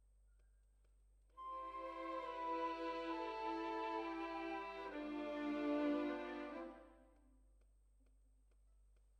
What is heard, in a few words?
Violins, jazz for piano